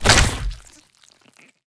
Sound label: Tongue Grab Hit; Evolve; Behemoth